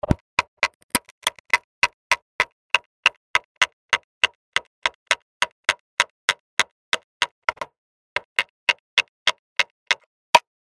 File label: Drumming; rome